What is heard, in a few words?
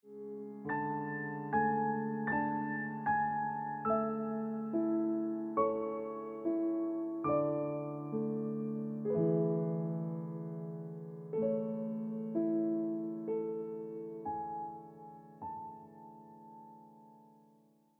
Ringtone Piano Music